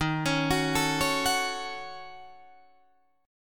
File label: Eb Minor 7th Flat 5th Eb down Guitar Drop D